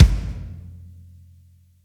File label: Drum; osu